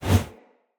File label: Player Torch Whoosh; Weapons; Blunt